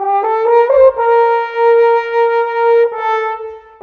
Horns, Orchestral, Rock Pop

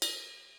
ride, Millenium MPS-400

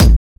kick, Drum and Bass

